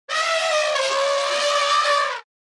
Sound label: African elephant trumpeting